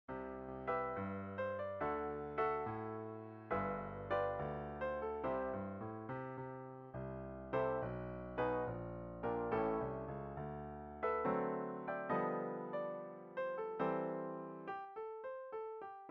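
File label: Come and sing with us Tenor, gospel choir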